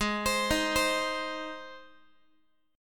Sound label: G sharp Major G sharp Guitar, chord; arpeggiated; x,16,15,13,16,x